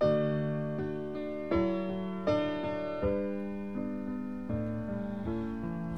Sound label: piano, Sad